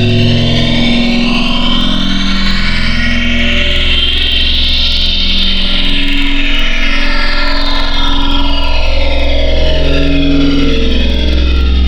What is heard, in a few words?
Hyper, Wavetables